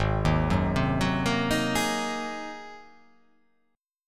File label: Ab 9th Ab down; Ab9 arpeggiated; JGuitar